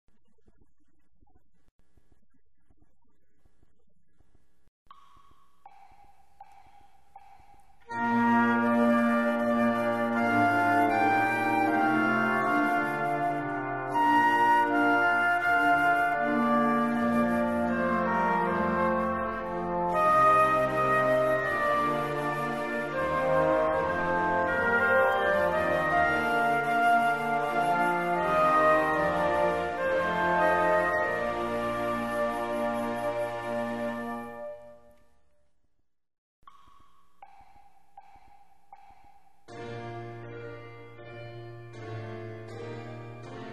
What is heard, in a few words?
chorale; hansel and gretel